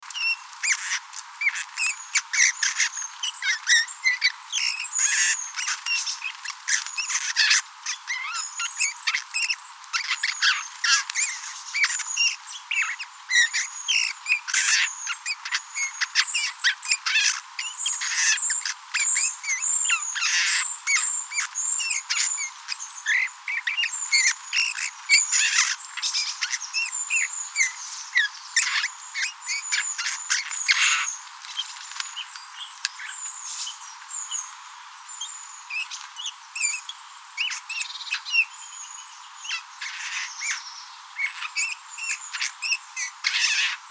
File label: Pyrrhula pyrrhula; SETE